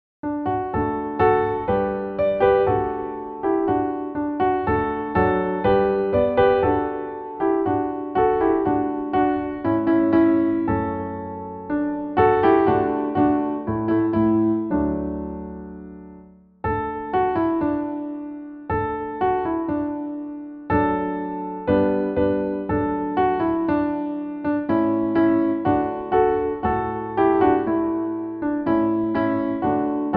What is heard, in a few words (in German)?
christkindelein playback